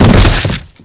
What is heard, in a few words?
body fall slam; Physics